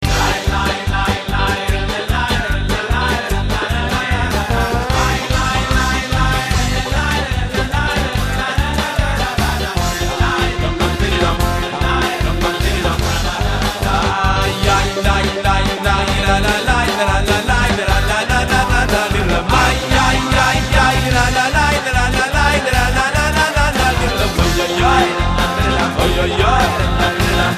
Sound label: nigun